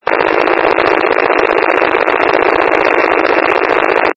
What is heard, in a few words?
wcalert, County Wide Paging; County Wide Paging Archive